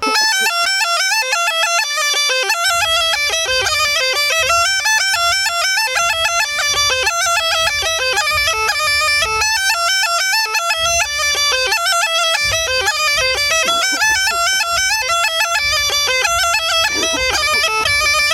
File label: Small Gajda, Full Speed; Chetvorno Line 1